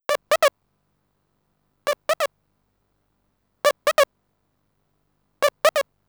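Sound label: synth; Evolution 070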